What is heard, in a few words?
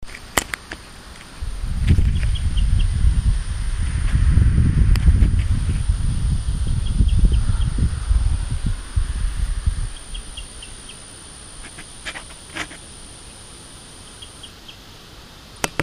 Eleutherodactylus cystignathoides